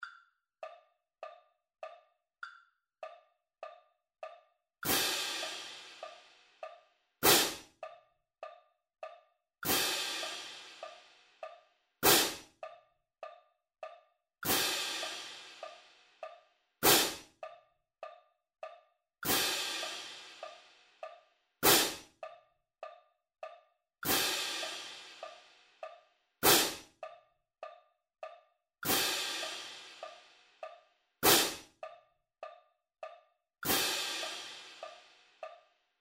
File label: 8 On A Hand 100 bpm Cymbals